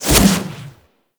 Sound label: Melee Jump Slam Charge; Warframe; Weapons Melee; Whooshes